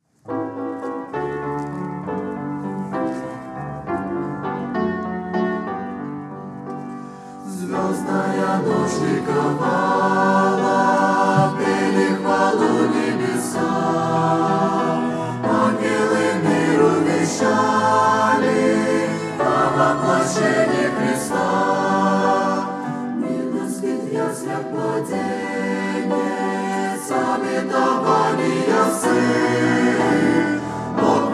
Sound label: Choir; Церковь «Путь ко Спасению»; christmas